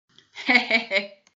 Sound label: hehehe